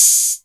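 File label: Hat Open, Novation Drumstation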